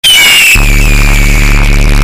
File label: eagle bass boost